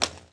golf ball land; Tony Hawk's Project 8; XBOX

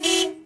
horn, car; Cars; XBOX

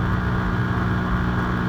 xray, ambience; Soldier Of Fortune II Double Helix; XBOX; shop

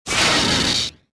pain; Star Wars Jedi Knight II Jedi Outcast; XBOX; mark1

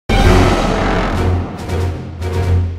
Fight Lose; music; Silas; The Da Vinci Code; XBOX

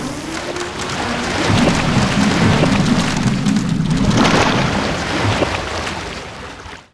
ship destruct; Sid Meiers Pirates; XBOX

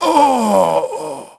death; SOLOMON; Pulse Racer

